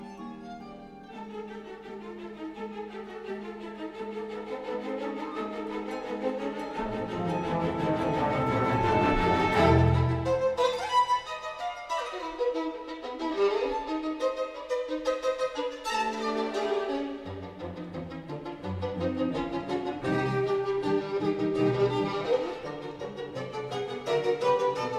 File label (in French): Concerto pour violon et orchestre Allegro assai